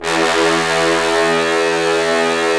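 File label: brass trombones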